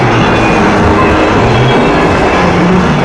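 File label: Scrape Interior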